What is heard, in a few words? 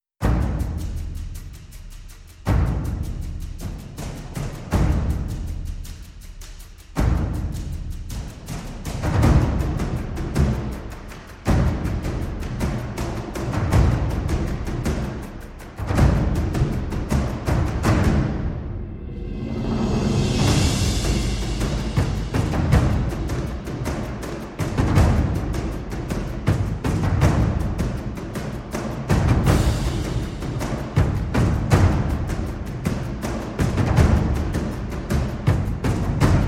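percussion only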